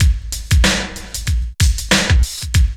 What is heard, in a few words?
LOOP; E-Lab; X Static Goldmine 2; akai